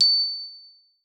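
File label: glockenspiel C#4; Xsample VOL-4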